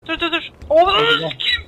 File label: dur dur dur